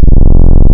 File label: Pulse Width Mod, wavetables; Animoog